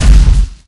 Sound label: monster action shouting gorilla